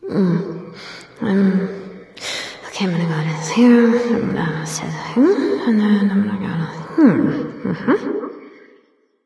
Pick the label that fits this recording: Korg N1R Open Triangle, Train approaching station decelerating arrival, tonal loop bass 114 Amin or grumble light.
grumble light